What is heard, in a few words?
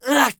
hit; Escape from Tarkov